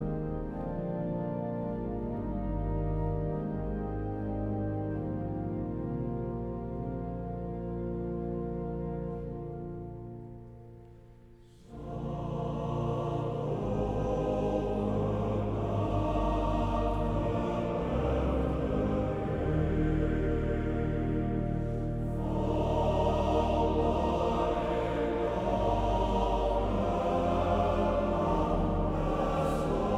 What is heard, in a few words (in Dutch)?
Koor mannen